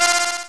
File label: bonus